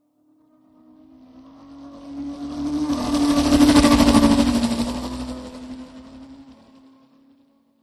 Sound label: Passby Designed Whoosh Futuristic Scifi Ripper Racer Glider Distorted Saturated; PMSFX